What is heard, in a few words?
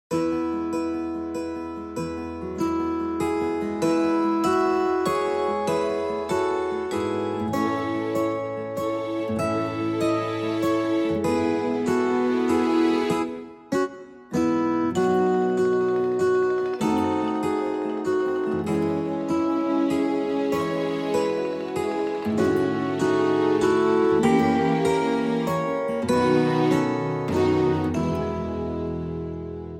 chime